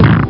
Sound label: splat; greeblies